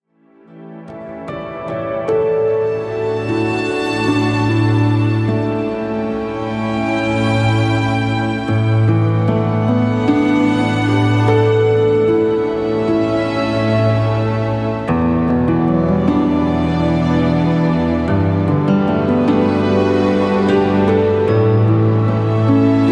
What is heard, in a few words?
Relaxing